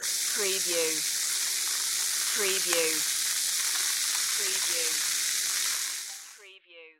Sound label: Soda Fizz